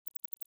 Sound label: Myotis bechsteinii juv